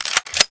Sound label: xm cock, weapons, xm1014